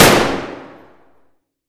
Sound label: adar fire indoor close; Escape from Tarkov